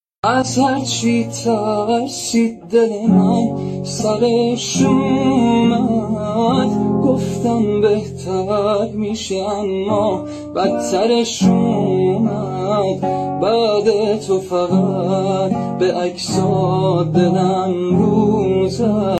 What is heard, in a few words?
Guitar